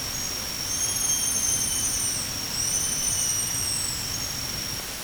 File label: loris vocalisations